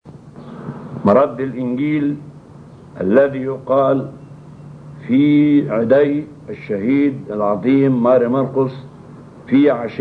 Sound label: Gospel Response; Alhan Shohada; Martyr Markos